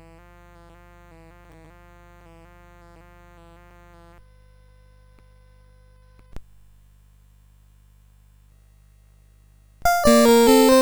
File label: Allegro in B Flat Major, CSG8580R5